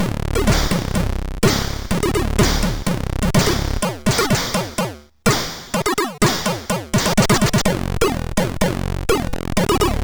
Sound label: sid MOS6581R3